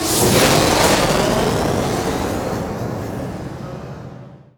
sunray cast; dota; phoenix

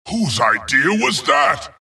death long; dota; skeleton king

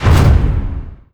profile close, ui menu

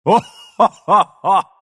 pangolin laugh; dota

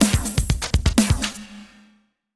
taunt; weapons; dota; bristlebog

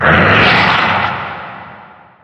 battle trance cast; troll warlord

